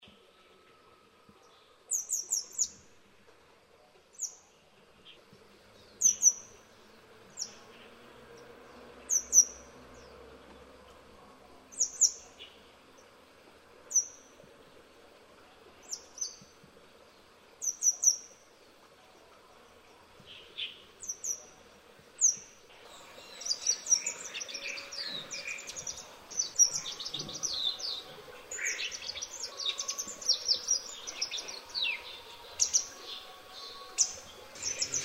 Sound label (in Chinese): 灰鶺鴒; 鶺鴒科/冬候鳥